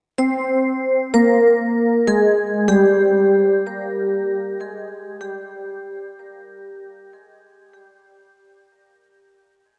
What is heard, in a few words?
Oneshot Rhodes; Zombie Army 4 Dead War